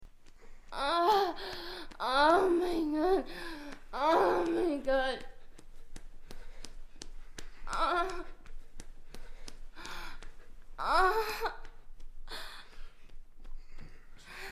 moan

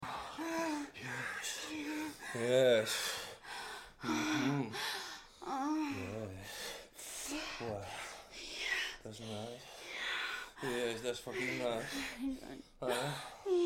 moan

